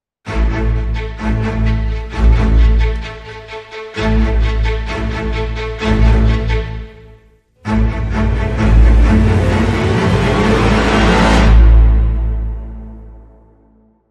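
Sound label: Hybrid Sting, Orchestra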